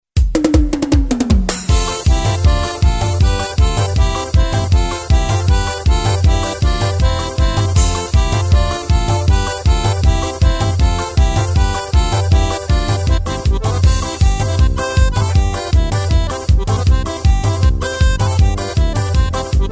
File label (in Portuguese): arrasta pe festa junina